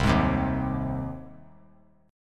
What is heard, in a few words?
C Minor Sharp 5th C 3C,3F,3A,3D; strummed; JGuitar